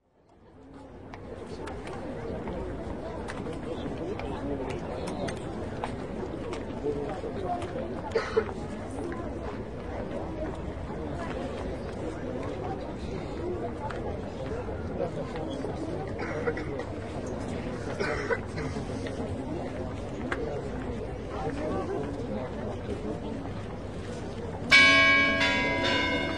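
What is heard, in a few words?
Red Square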